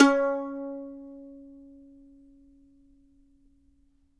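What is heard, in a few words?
C#4, Mandolin; Sampleheads; Whole Lotta Country 1 & 2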